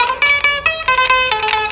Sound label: TANBURA; Best Service; World Colours